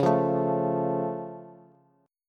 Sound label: Db Augmented, down, Bass; strummed; JGuitar